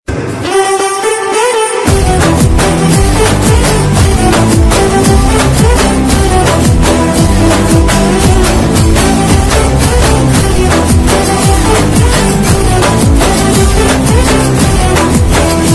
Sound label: ringtone love sad ringtone